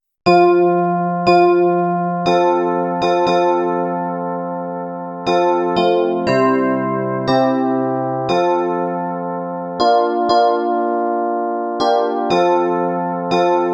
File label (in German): F-Dur Electric Piano 2